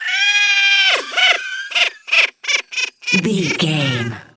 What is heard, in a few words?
goblin fairy laugh intense